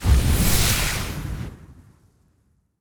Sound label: card shining